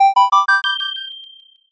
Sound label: heal